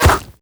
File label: character hit; projectiles; bullethit; Max Payne